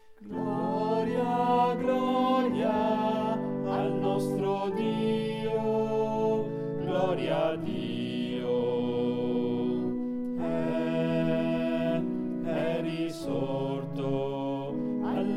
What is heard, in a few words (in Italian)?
BASSI; Quaresima e Pasqua 2018